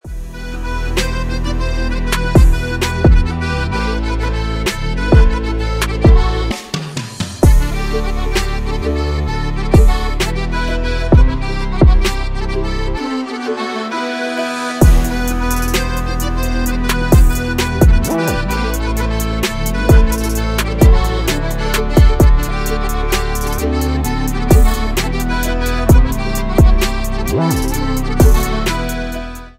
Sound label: beatz